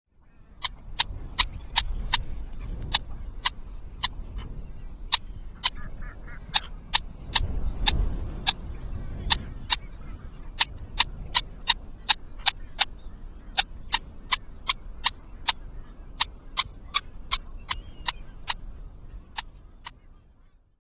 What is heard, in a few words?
rufous night heron